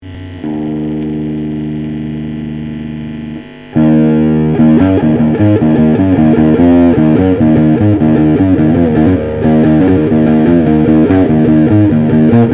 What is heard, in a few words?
Bass